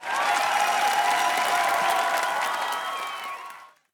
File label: crowd Cheer; robin hood